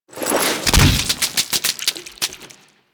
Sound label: Fishmonger Provide Fish, UI; Warframe; Cetus